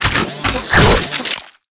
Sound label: Mech atk1, monster; steamer crazy